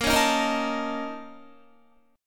A sharp Minor Major 7th Double Flat 5th, A sharp, down, Guitar; A#mM7bb5 strummed; JGuitar